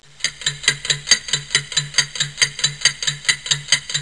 Clock Ticking; Soundsation

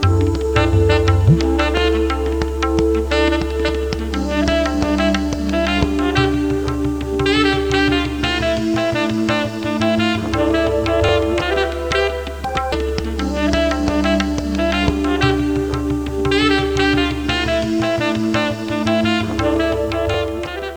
theme; Sexy Games 3

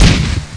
explosion m80; Army Men TiS

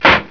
bang

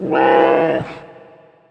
maia, maiasaur

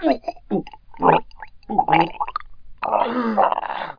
player choke; Daikatana

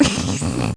sneeze; bloopers

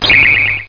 Multiplier Appears; Maelstrom

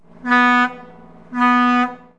Tugboat Air Horn; 1,000 Sounds; Outdoors & Nature; Boats & Water

